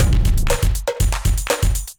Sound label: Kindified Kit, Drums; Kindified Kits